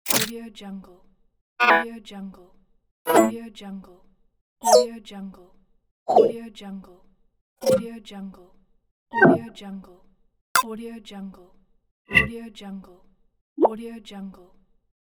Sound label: ui buttons pack